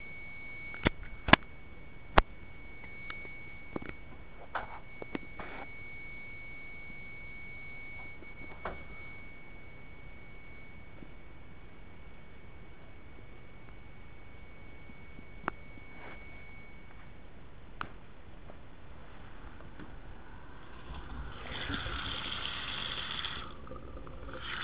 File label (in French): bruit canalisation, plomberie